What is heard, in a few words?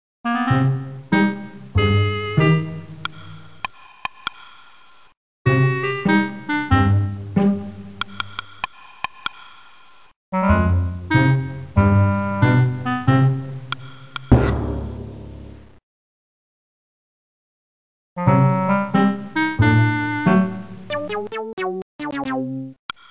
Swamp; Red Comrades Trilogy Reloaded